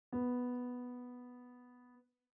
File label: click, piano